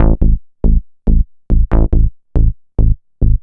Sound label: Synth Bass; Basses